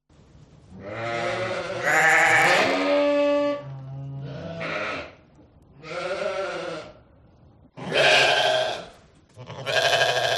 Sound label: Sheep; audio hero